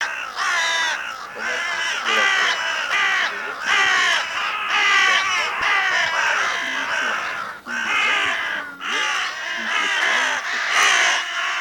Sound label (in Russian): Стая ворон Corvo; branco; plurifon